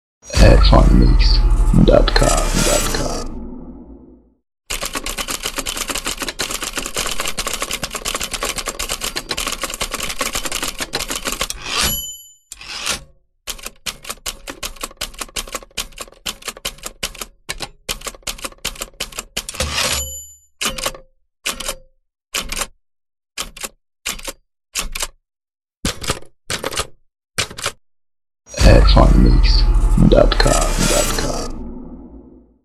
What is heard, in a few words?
Classic Typewriter; erfanmix